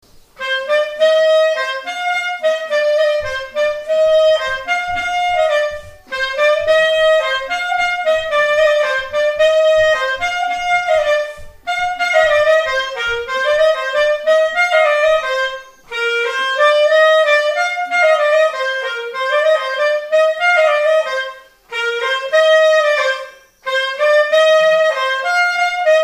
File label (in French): marche, clarinette